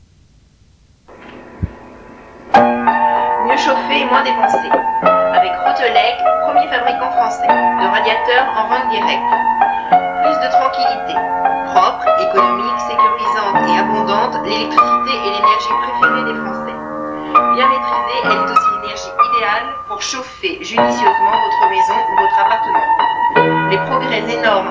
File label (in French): Avec un piano